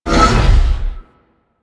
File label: Large Door Close; Orokin